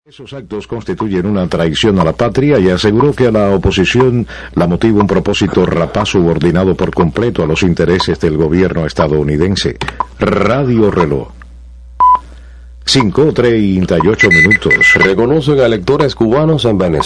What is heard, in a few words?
stream ID and CW; R Reloj CUB